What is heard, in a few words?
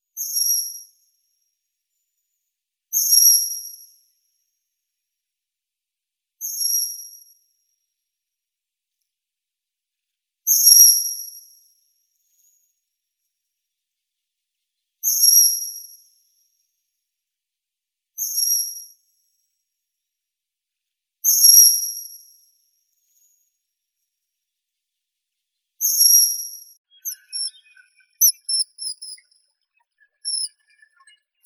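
common kingfisher, Alcedo atthis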